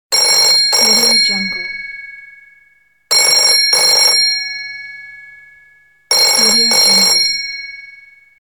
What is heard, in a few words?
old telephone; klassikua